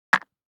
DICE on Cardboard, Drop, Standard, 4 Four Dice; Samples; ArticulatedSounds; DICE Sfx Party (Extended)

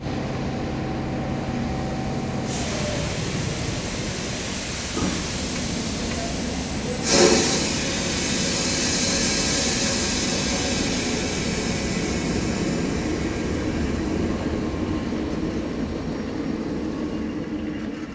train pressure down; best service Blue Box

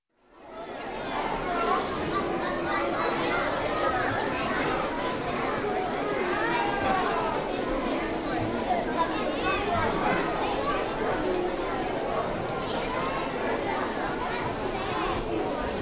Children School Auditorium - Awaiting Show's Be; Sound Ideas 2000 Series; Awaiting Show's Beginning

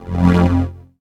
Scifi Forcefield Disable; Energy & Forcefields

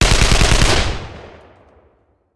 Machine Gun; Sound Ideas; Guns

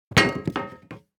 DICE on Metal, Throw and Roll, Foam, 2 Two Dice; Samples; ArticulatedSounds; DICE Sfx Party (Extended)

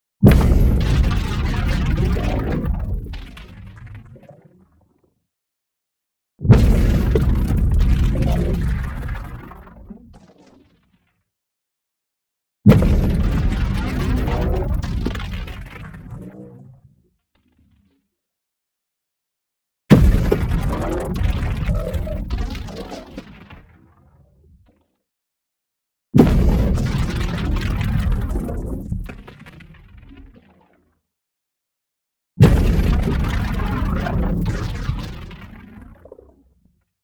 HIT LARGE Post Nuclear Strike; NEON; boom library

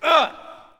Hockey Male Hurt; SFX Kit; Hockey Vocal Sounds

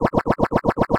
Arcade Movement, Video Game Sounds

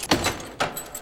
Pickup Key; SFX Kit; Pickups

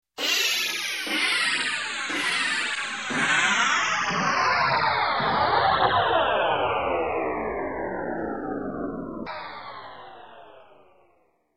Space Several Space Fades, Electronic; Sound Ideas 2000 Series

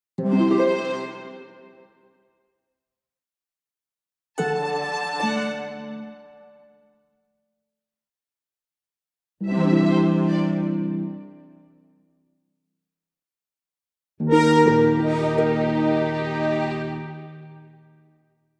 Orchestral Jingle Neutral

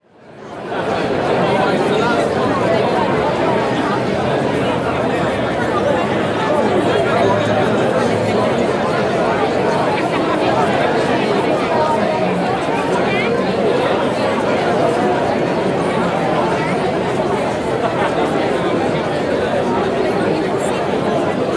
Crowd Outdoor Busy Tight Crowd, Walla; Sound Ideas; Walla Series

